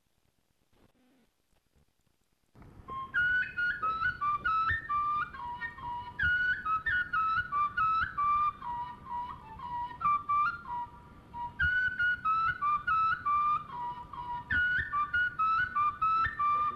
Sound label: quena, ethnic instruments